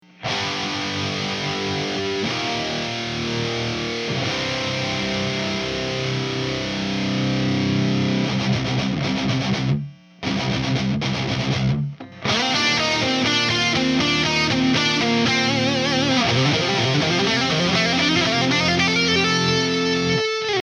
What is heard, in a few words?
amp